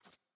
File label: ui map panel selection change